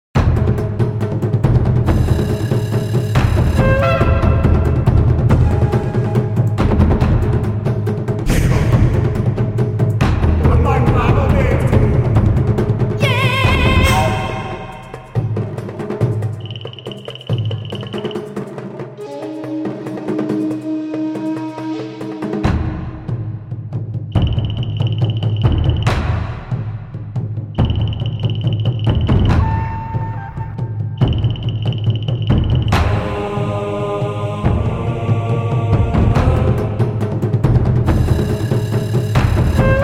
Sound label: Bagpipe